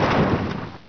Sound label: bust concrete, debris; Source Engine; dod